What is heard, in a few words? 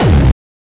Hard kick; Bluezone